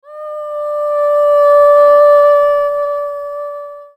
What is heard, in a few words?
Whooooo